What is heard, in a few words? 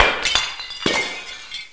glass